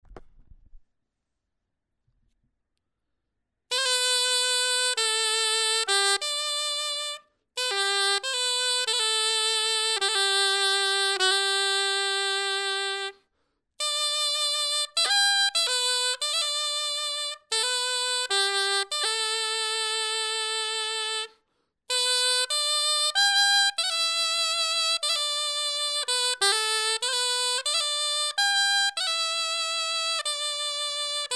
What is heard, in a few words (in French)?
clarin etude